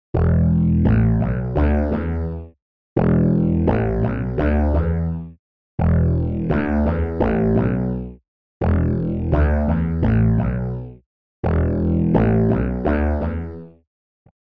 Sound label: mellow sweeping bass wet